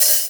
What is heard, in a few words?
HH OPE; Best Service; Alpine Volksmusik; DRUMS